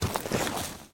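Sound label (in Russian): шелест от перекладывания книг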